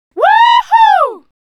Woohoo; Big Beat

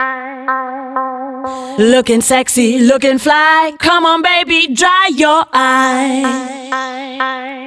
Lookin, женский вокал

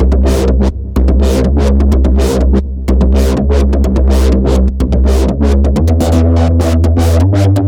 Bass modder

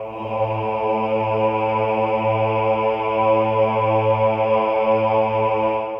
Choir Bass; Музыка для фильмов

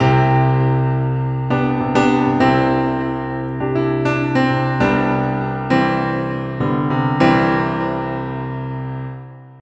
Grand, keys

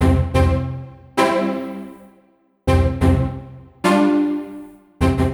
Strings Up; струнные инструменты

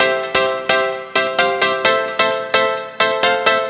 Piano; Bryggeri

